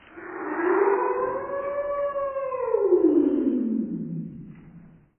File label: Howling